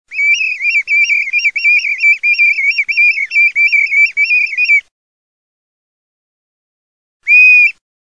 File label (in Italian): PIVIERE MALTA